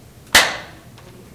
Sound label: hand clap